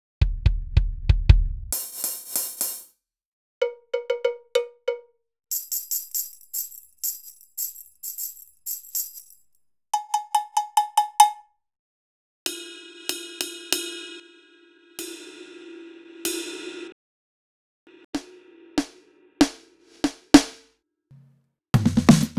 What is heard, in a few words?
shadow drum; soundboard